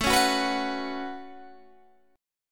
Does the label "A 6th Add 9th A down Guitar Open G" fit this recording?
Yes